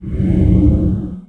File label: cold cone; cstrike; warcraft3